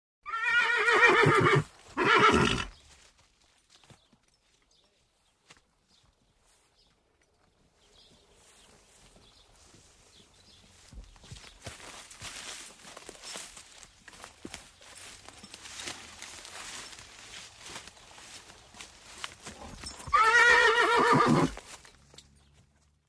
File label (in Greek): Zwa Alogo